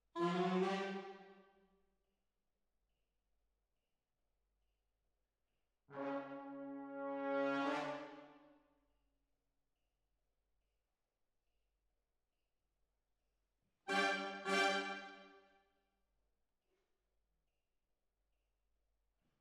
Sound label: Hall, Jazz for piano